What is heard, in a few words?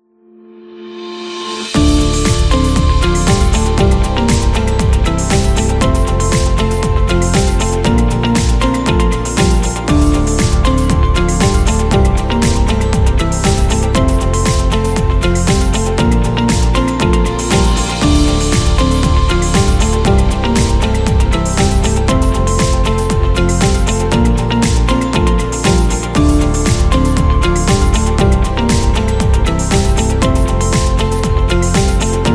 Presentation Corporate